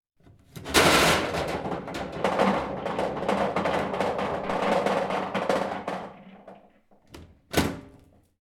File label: A Pool Table Mechanism Releasing The Pool Balls To Start The Game